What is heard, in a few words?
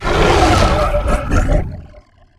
tower death radiant, building damage; physics